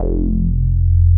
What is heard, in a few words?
DIGALO F2P, DIGALOGBS